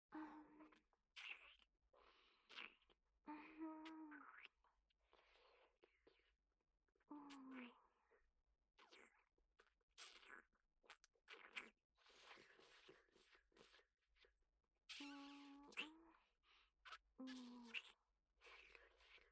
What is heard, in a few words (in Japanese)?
フェラ, ASMR; アドリブ集